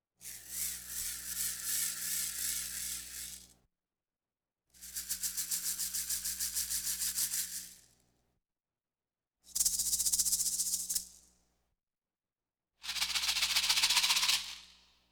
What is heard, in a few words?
Rattles Percussion Accent; Electa Quinney